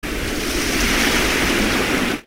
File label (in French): Vent au bord de l'océan